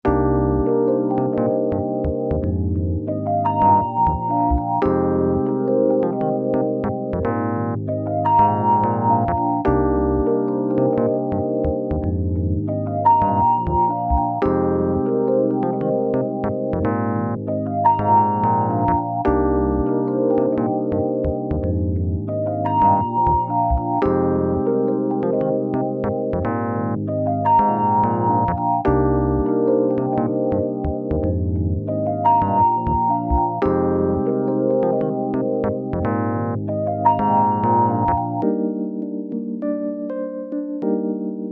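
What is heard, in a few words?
Reverb Suitcase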